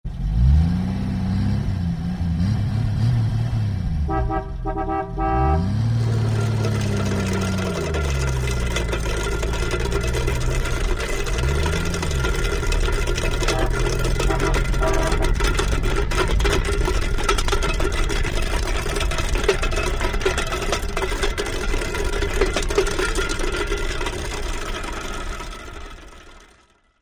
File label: car pulling tin cans; SOUND EFFECTS1